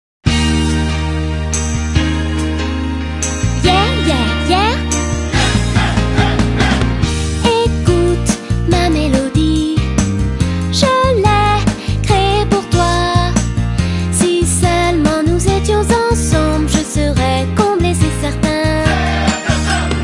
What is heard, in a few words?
French